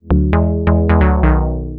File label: 2 Step 133 SYN, House; USB Soundscan vol.51; House Side Of 2 Step; AKAI